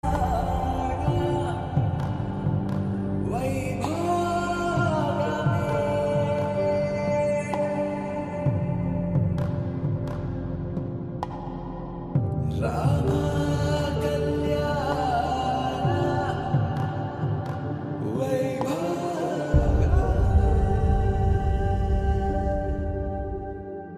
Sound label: sanskrit sad, ringtones